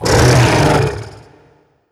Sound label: beast vocal angry growl